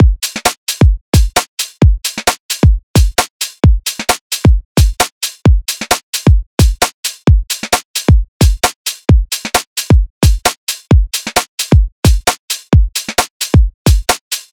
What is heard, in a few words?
Drum Loop, 132 BPM, Garage; Centre; Cymatics; GHOST (Beta Pack)